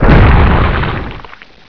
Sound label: mega gib; Heretic II Demo